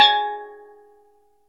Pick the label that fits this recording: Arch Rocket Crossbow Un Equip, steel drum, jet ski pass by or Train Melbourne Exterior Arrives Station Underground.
steel drum